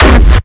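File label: Raw Kick, Hardstyle; Kick Compact Pack Vol.1; raw hardstyle kicks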